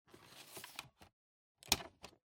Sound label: Optical Disk Drive Eject